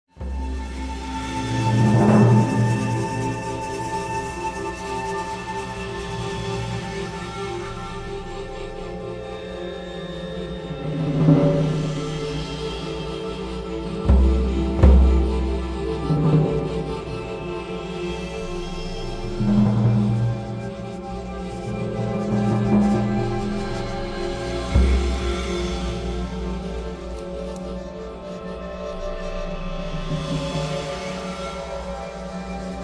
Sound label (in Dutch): Cymbalen